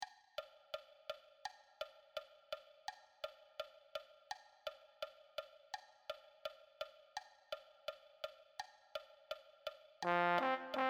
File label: Low Brass